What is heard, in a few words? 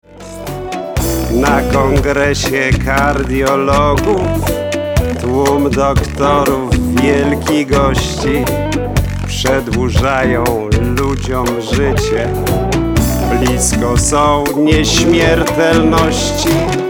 bossa